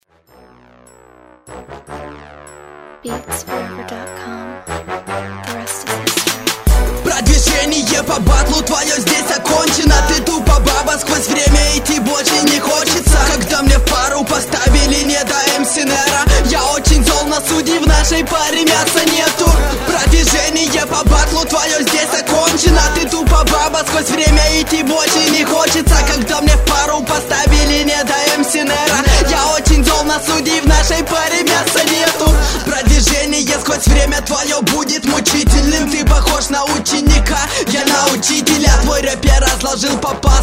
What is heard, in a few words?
Slam, rap